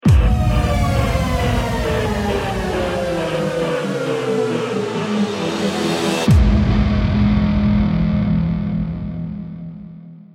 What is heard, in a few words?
lost round, music